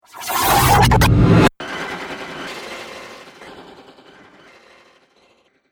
Trailer Hit Glitch; Trailer Sound Effects

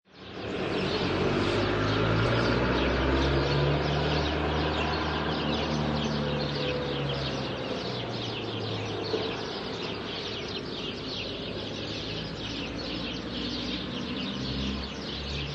Rural Ambience; Late evening in countryside rural area. Distant dog barking, occasional cars driving by; Outdoor; Lynne Music

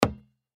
Basketball Bounce Wood Surface Thud; Basketball (Sound Effects:Sports

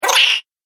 Night Gremlin, Monster; horror

